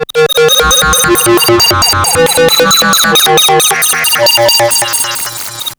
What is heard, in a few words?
2 tone, fx & sweeps; EZRollers